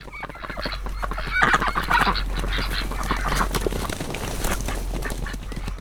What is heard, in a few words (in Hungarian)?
kacsa megzavarása; állathangok; paraszt udvar